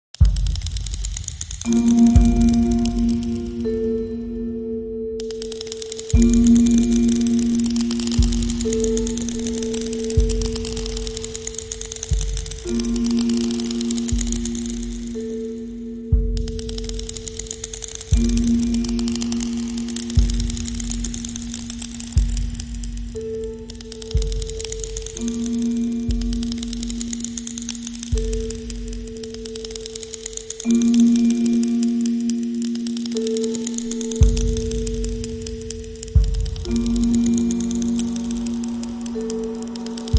It Is Ticking

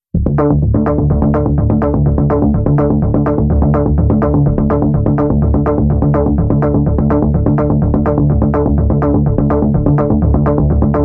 Synth Bass

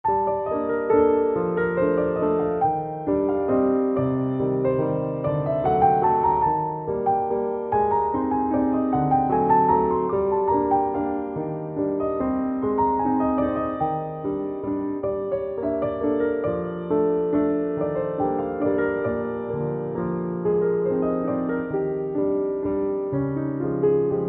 Valse Fantastique

